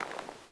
Leather Creak Short; Civ4 warlords

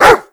animal dog bark alt; Call of Duty Modern Warfare

